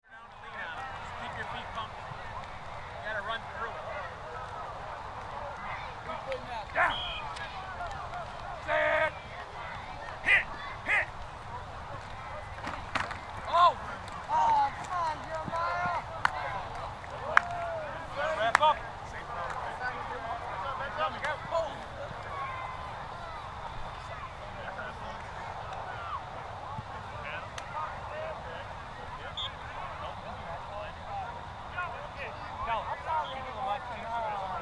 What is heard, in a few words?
Ambience Football Practice High School Sports; Football Sound Effects; Football (Sound Effects:Sports); SFX Bible 6